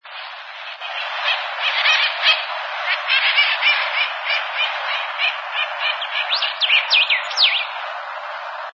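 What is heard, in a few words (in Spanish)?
voz calancate ala roja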